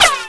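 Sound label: laser ricochet; weapons; hl2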